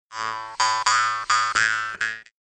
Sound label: jews harp